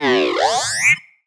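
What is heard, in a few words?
Sci-Fi Droid Robot Emote; jasem just another shooter with electronic music windows 2017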